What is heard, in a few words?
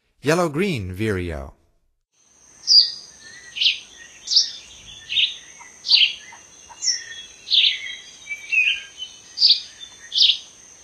Yellow-green Vireo, Songs of Birds of North America; Stokes